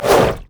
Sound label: basic attacks; Ziggs; poolparty